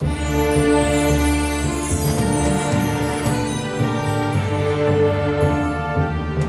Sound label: Bonus Loop Intro; Mirror Magic; genesis